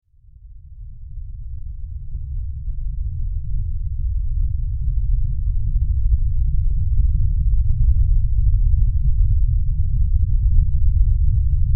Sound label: Weather Earthquake Rumble Deep; Rumbles; Sound Design